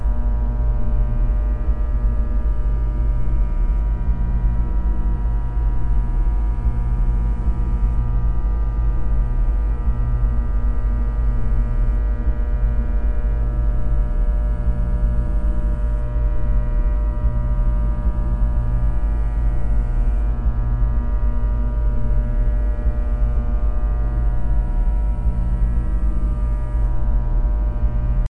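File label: neon background ambience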